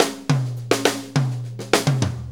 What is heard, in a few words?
4-4 Fill, Drum Loops; Drum Tools; Fills; Sonic Foundry (Sony Creative Software); Loops & Samples Drum Tools